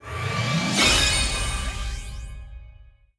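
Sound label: System Skill Levelup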